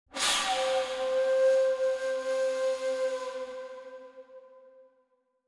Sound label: ambient